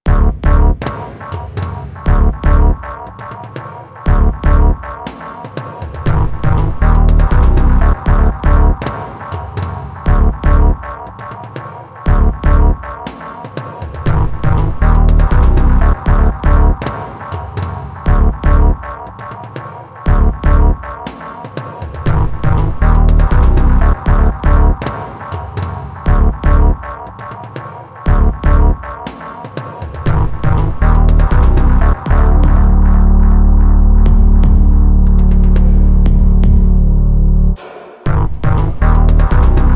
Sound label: Music Loop Stem; gamerip; Zombie Army Trilogy; PS4